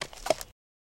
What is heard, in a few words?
Horse Hoof Step On Gra